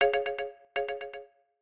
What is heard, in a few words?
Traditional, notifications; Tinno ATT Radiant Core